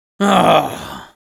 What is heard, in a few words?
Spy negative vocalization